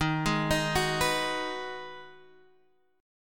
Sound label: Ab Minor 7th